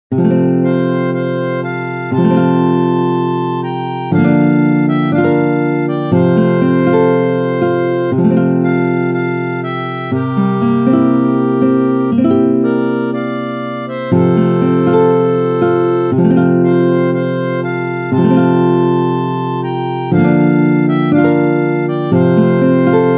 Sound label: Harmonica; How Great Thou Art